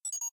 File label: bot default, robot